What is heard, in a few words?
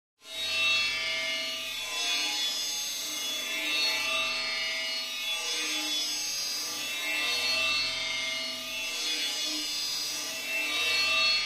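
Alarm Computer Digital Flange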